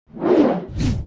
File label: skill shot ready